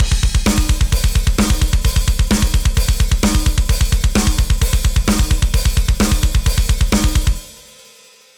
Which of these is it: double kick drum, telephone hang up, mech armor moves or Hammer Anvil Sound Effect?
double kick drum